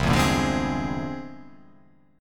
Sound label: C Augmented 9th